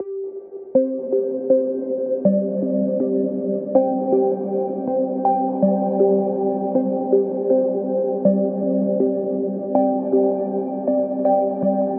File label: Musical Loop 80 Synth Pluck C Major; Ghosthack